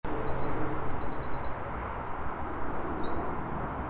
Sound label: Troglodytes troglodytes; SETE